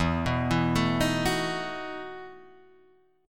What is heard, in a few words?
E Major 7th Suspended 2nd Suspended 4th, Guitar, Open G